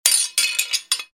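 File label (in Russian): скольжение прерывистое; Звук мечей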